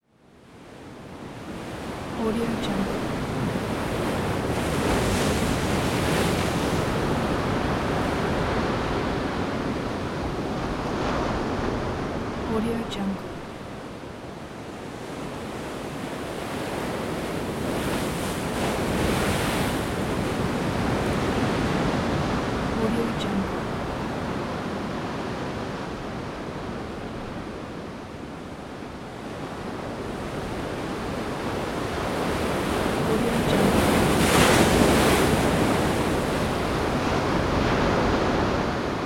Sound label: ocean waves